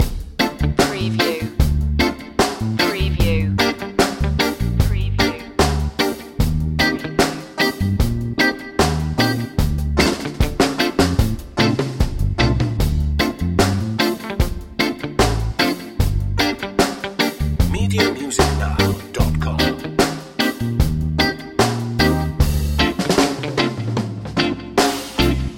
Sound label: summertime reggae loop